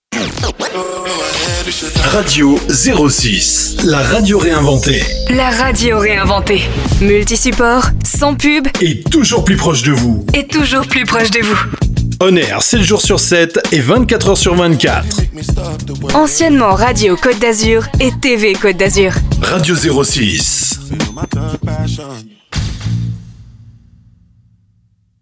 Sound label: Jingle; Radio06